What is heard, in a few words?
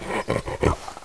Ogre